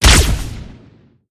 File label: fire, weapons; ppistol